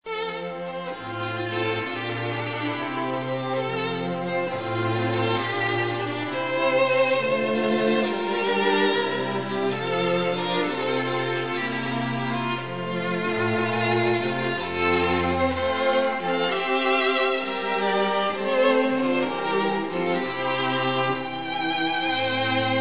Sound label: duo flute harp; handel water music air